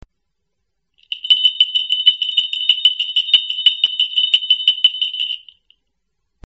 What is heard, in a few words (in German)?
drachen